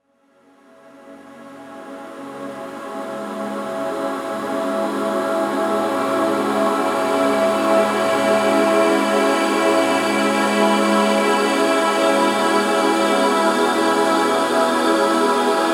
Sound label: Light Chamber Experience